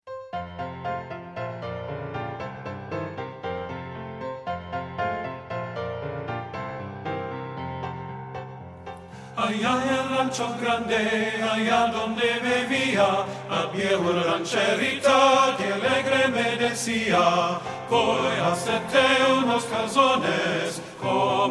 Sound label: TENOR; men